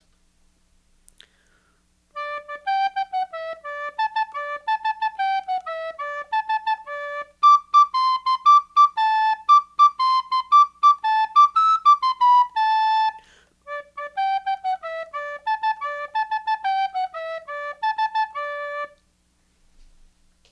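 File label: wooden soprano, recorders; lhomme arme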